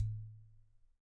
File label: Udu High